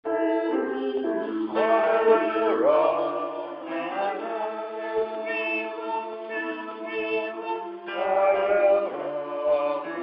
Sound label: Bass, singers